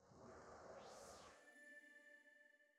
Pillar Open Line Draw; Desert SFX

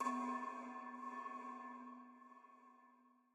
UI Sea

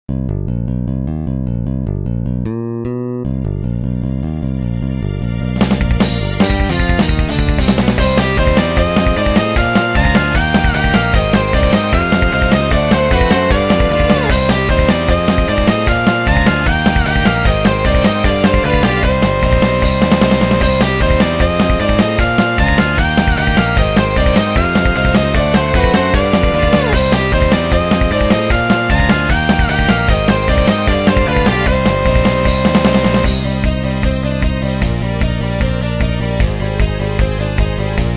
Rock; winter memories windows gamerip 2024; Game Maoudamashii 7 Rock37

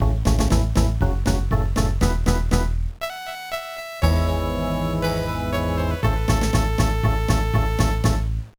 Fanfare; Shin Megami Tensei II; GBA

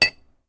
Impact glass medium; Puddle; Puddle Plus

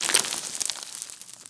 slide stop; aragami

